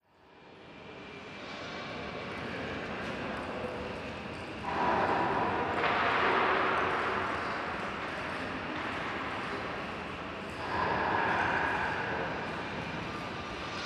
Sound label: Internal Industrial, factory